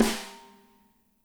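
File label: snare left; Spectrasonics; Burning Grooves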